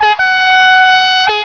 klaxon, Train; X4200 Rouge

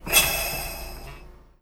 air zero; OpenBVE

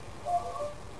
Spring R, train; OpenBVE

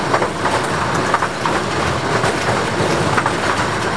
Run, Train; OpenBVE

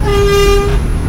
klaxon, Train; R142; OpenBVE; Jerome Yard

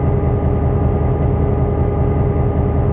Motor, Train; F40PH-2.6

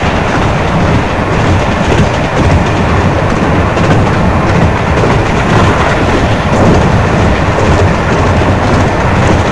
run, Class 47; OpenBVE; Train

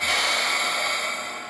Air, train; OpenBVE

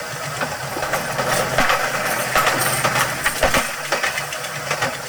Run, Train; OpenBVE; Cl313 Silverlink

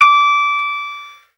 Aws bing, Train cl158; OpenBVE

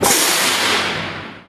Emr Brake, Train; R110B; OpenBVE

